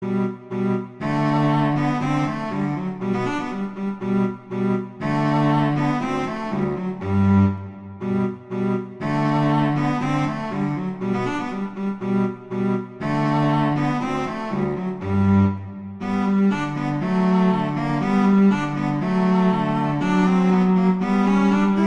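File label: Duet, horn; Mozart; Duets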